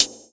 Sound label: raw pedal hi-hat single hit a sharp key